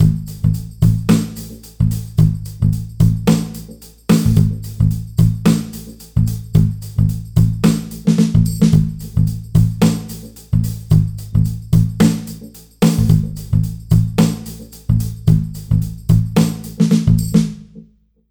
semitone riff wet; aas objeq delay manglers

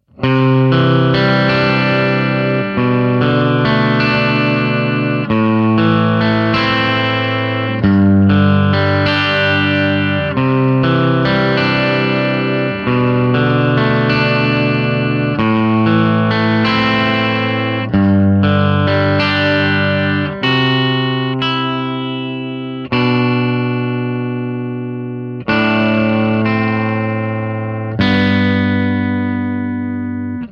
arp amp; proco; rat2

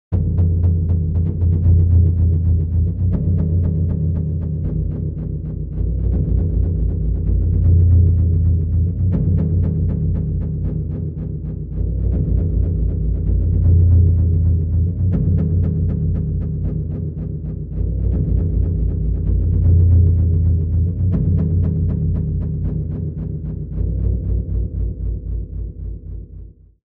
delay drones subterranean wet; aas objeq

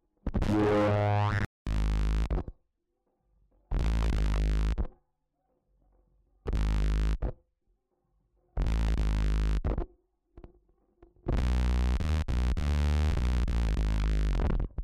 Mix Contest Bass On; Lewitt

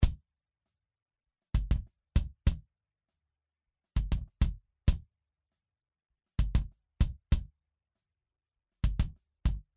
Mix Contest kick On; Lewitt

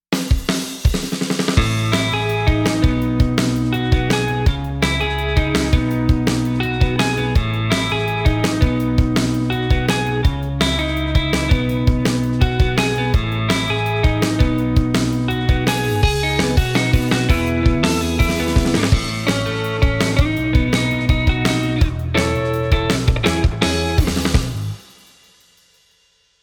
clean guitars on

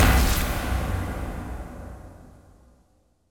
spellcard fx

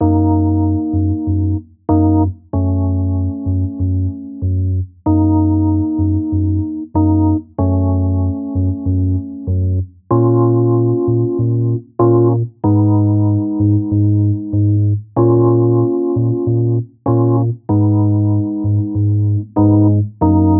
delay modulators plate tremolo wet; aas objeq

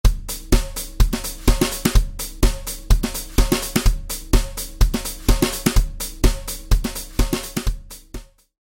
snare with brushes; Pork Pie; fxpansion; snare selects

